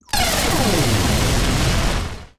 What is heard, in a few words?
cyclops